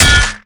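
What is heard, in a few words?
bottle hit robot; melee impacts; Garry's Mod; tf2; mvm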